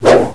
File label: big sword swing